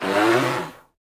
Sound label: African elephant airy whooshing breaths